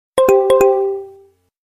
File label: Samsung Galaxy Pulse Beam